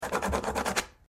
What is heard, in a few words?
pencil